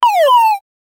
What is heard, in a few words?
claiming a coin but its, banana